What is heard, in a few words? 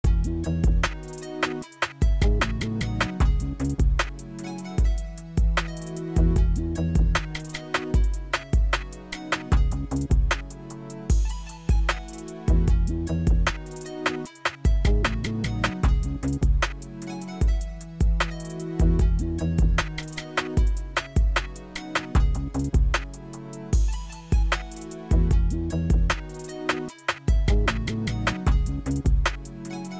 Future beat